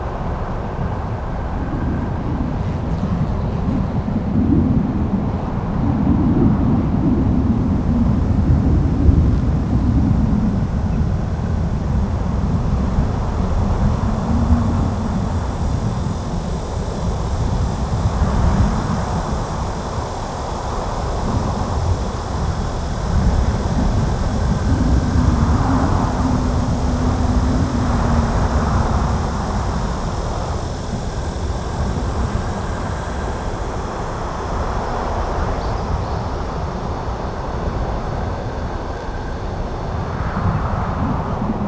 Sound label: Canyon Wind Amb Loop